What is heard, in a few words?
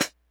hihat, Hardcore Breakbeat 152 Dmin; Big Fish Audio; Hardcore Breakbeat Action; Gone Insane